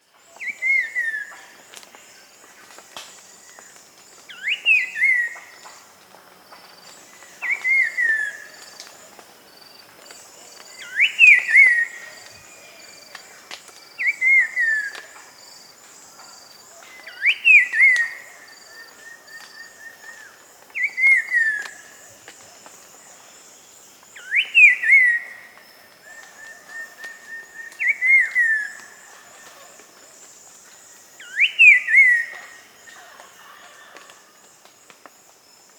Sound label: Slate-colored Grosbeak (Pitylus grossus), Peru; S. America